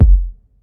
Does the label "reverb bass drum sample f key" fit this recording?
Yes